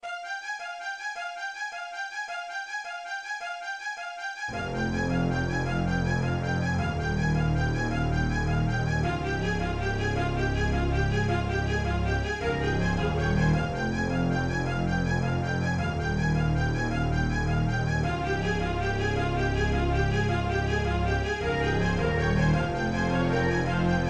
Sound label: suspense